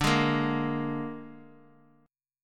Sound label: Ab Suspended 2nd Flat 5th D, chord